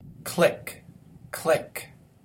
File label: click